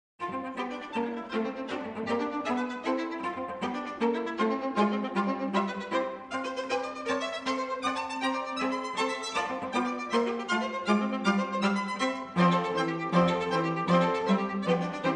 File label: String Quartet No. 1 in A Major, Scherzo Prestissimo; Borodin